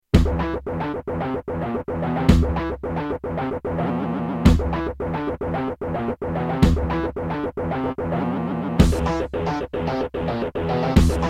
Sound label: Turtle Beach Maui; Doom